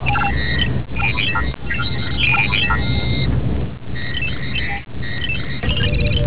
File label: Computer thinking a lot; startrek